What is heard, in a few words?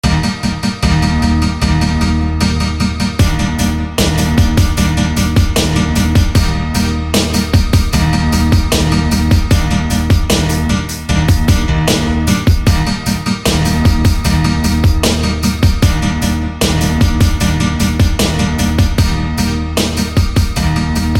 Emerald Guitar